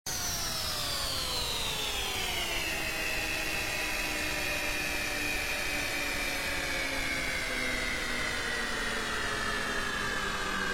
Nave aterrizando Efecto de sonido

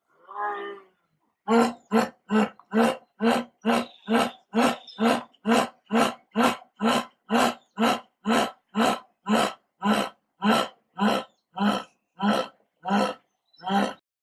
LA VOZ DEL JAGUAR

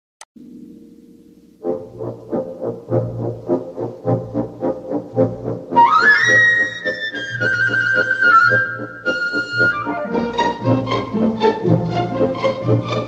Danza china

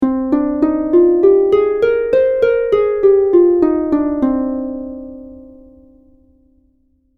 arpa

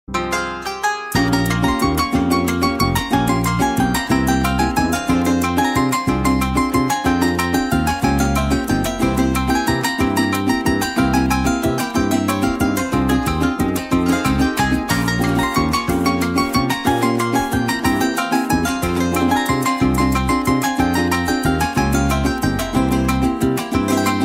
Pista llanera

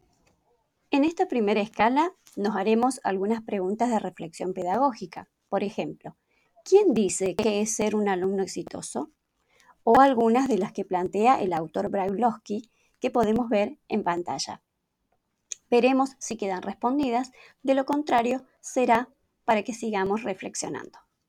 ESCALA

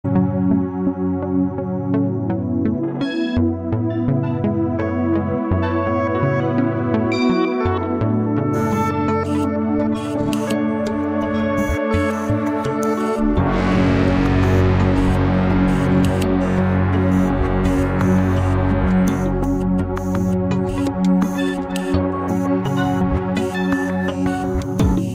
musica electronica espacial

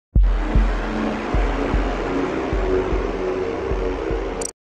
DERROTA; AMONG US

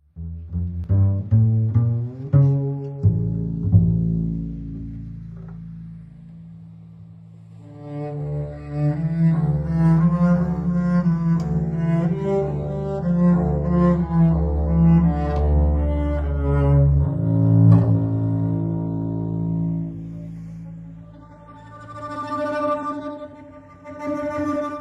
SONIDO DE CONTRABAJO

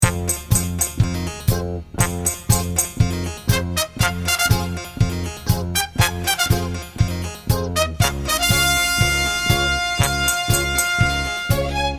el sonido de fondo; dragon ball z

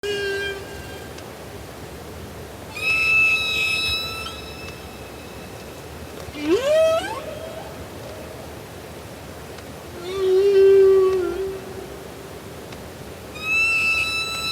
canto ballena

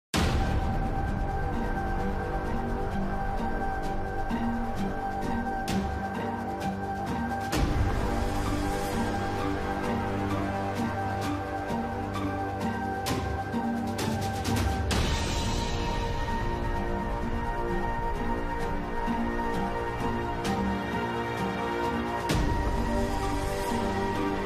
MUSICA DE SUSPENSO; Jingle Punks

